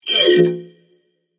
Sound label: grunt hit defeat; babayaga; smite